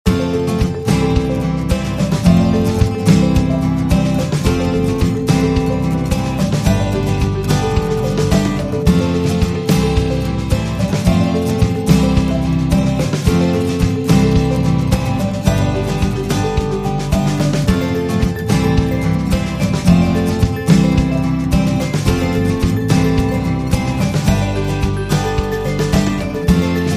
Happy Upbeat Ukulele

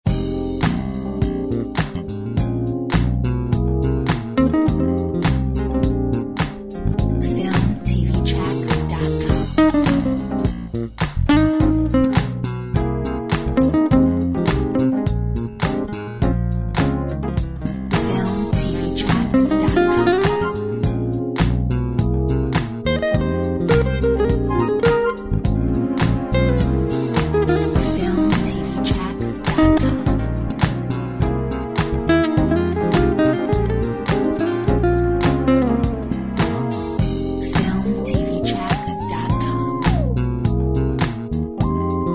Groove

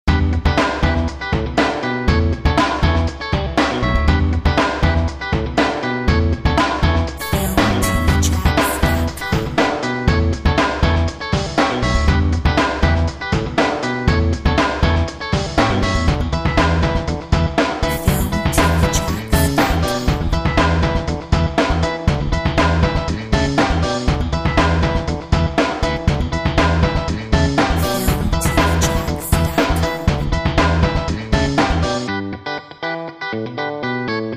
Funk train

